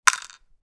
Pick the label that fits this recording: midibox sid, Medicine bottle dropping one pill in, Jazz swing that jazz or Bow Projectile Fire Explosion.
Medicine bottle dropping one pill in